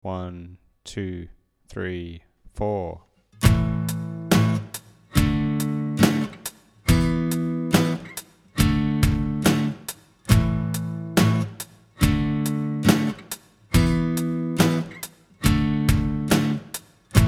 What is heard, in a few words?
power chords, guitar; wild tune